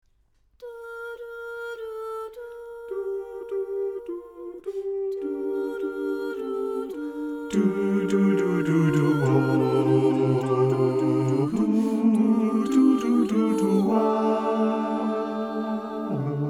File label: Bass; TMF; SATB